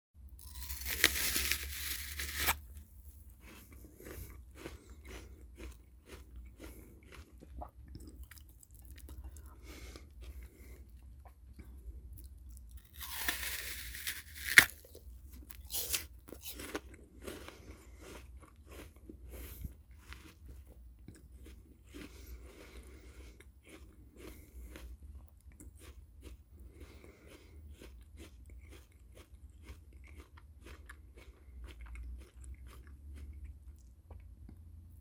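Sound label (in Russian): три трудных откусывания; Звуки откусывания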